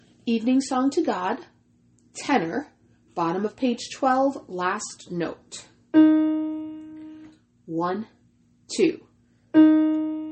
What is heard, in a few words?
evening song tenor